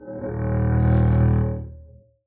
arco eb1 ff up, double bass; dsmolken

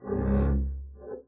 arco noise creak, double bass; dsmolken; noises

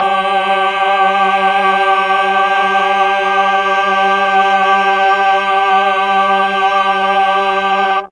G2 8 VOICE CH, mellotron; 8VOICE CHOIR

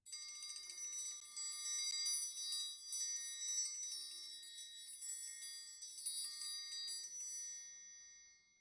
triangle roll; Idiophones; Struck Idiophones; Triangles

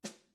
snare ppp, Struck Membranophones

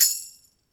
tamb, Tambourine; Idiophones; Struck Idiophones; Tambourine 4

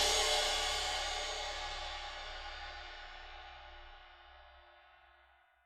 sus Cymb roll fff rel, Suspended Cymbal; Idiophones; Struck Idiophones; Suspended Cymbal 2

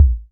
808 Kick Short; hydrogen drum samples

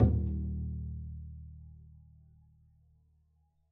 pizz eb1, double bass; dsmolken

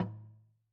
Log Drum Lo, Slit Drum; Idiophones; Struck Idiophones

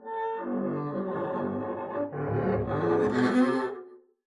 arco noise moan long piglike, double bass; dsmolken; noises

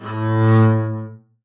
arco a2 ff down, double bass; dsmolken

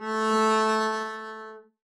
arco a3 f up, double bass; dsmolken

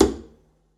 pizz noise click, double bass; dsmolken; noises

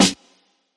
snare, 808 mini, drum machines

